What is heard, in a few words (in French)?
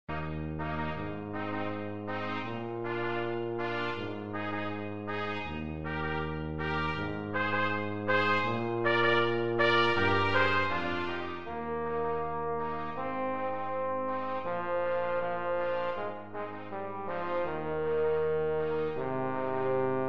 Jamaica quatuor cuivres